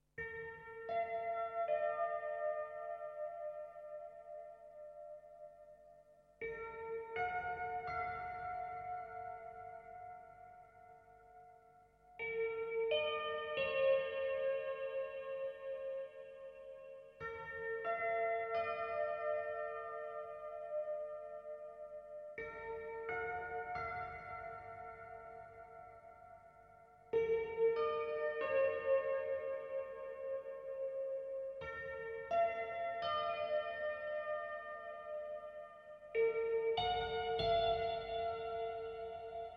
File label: piano